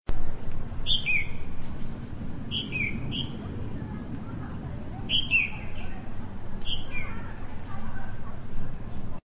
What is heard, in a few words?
red whiskered bulbul